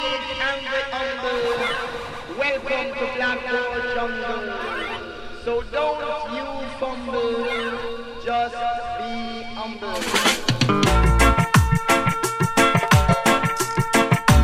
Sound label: dub